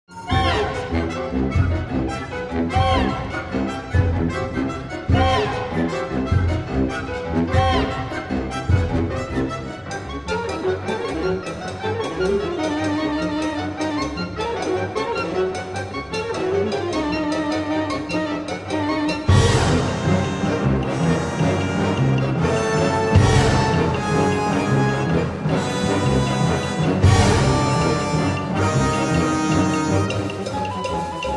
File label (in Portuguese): concerto para quarteto de saxofones